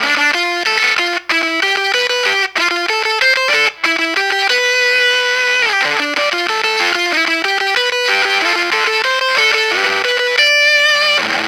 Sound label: lead; the tone splendor mod® electronics diy effect pedal kit